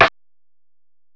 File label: STICK, DRUMS; M8; CMI Factory